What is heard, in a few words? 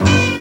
String n Horn (one shot G); Sound FX